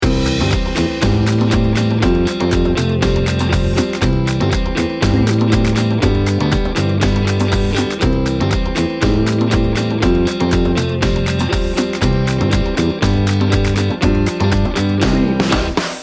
tropical festival loop

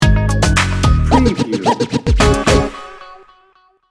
cool funk sting bumper

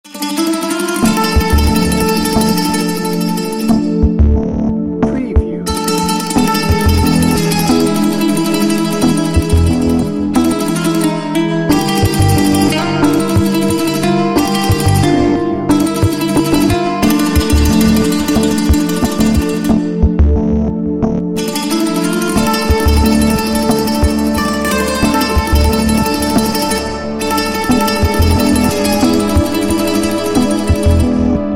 exotic mystery no drums

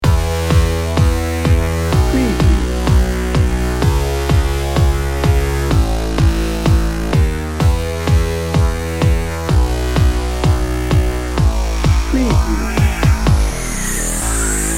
joyful edm dnb

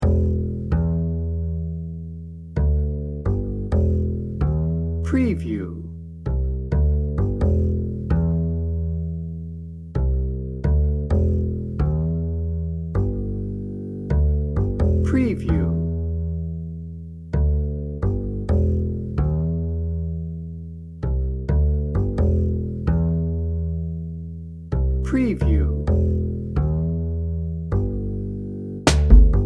breezy and positive dnb

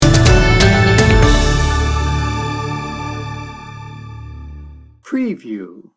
corporate team building sting bumper

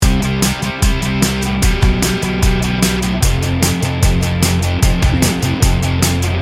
road trip loop

